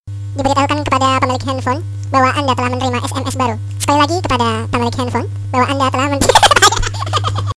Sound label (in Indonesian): Suara Bayi